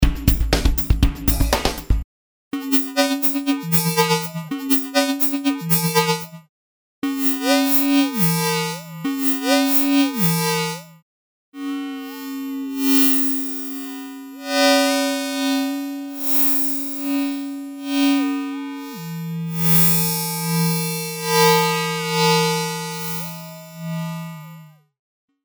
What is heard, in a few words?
Drumloop